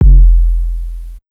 BD, Big Beat Drumloops; AKAI

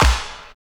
combi drums

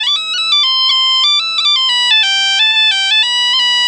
EM BP, Celtic Flavours, BAGPIP090; USB Soundscan vol.42; AKAI

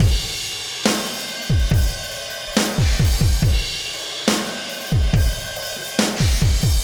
Landing Party Beat, Processed Drumkits

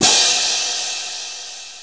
Ending crash; jazz jackrabbit 2

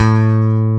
ROCK BS A3, PICK BASS

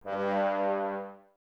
Brass, Woodwind Brass Ensemble; Miroslav Vitous - Woodwind Brass Ensemble

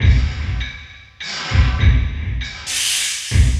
50 large loop, industrial loops

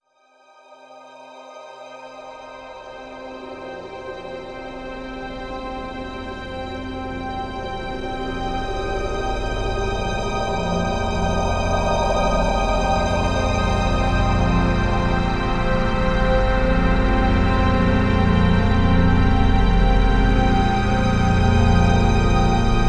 Dissonant Bed; Beds; Sonic Foundry (Sony Creative Software) - Slow Silhouette

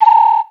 DR-660 FX; Rubber Chicken Software Sample Bank

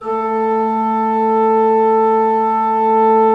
16-8 A3, Cathedral Organ; Propeller Island

